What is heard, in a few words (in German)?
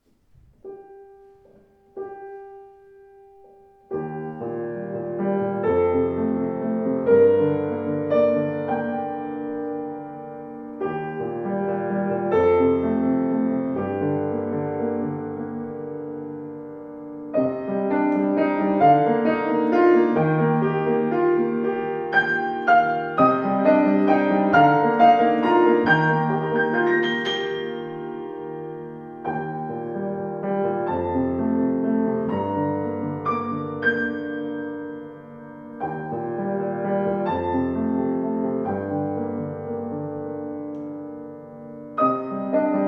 Yamaha C3 Klang